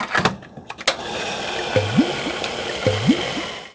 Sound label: gas up; Cars; XBOX